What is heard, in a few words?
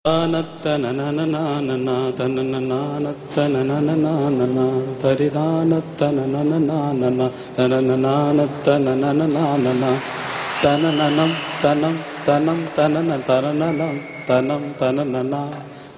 3 Notes Song Vocal